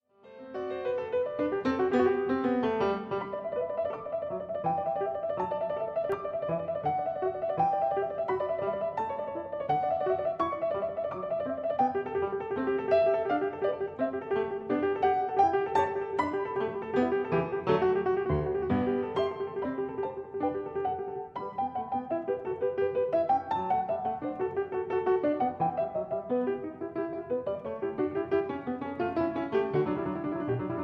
Variation Canone Alla Nona fuer 2 Clav

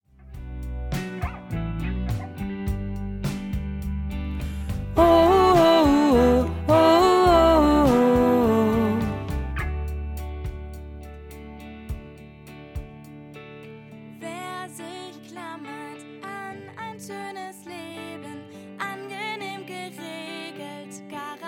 Lern-Alt

